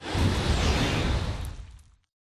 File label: atlas dash slide; Titanfall 2